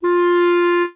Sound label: Clarinet tone; lego my style preschool